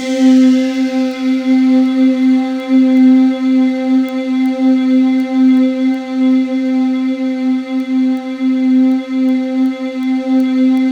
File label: C3, Synth Leads